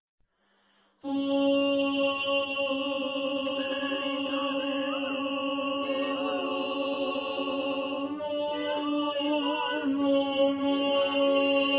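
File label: tenor; scalerica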